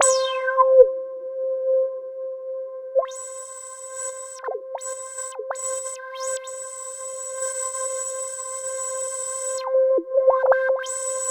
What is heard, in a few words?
C5, Synth Line; 90 s Sample CDs; NiceBeats; SynthLine VOL-17